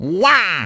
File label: effort; waluigi